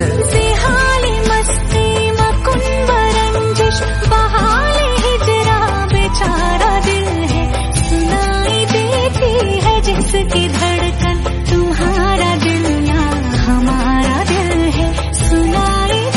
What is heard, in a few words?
Female Hindi